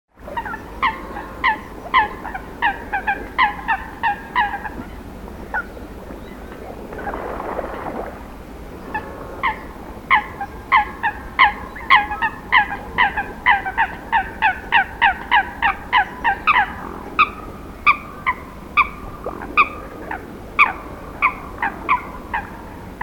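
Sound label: coot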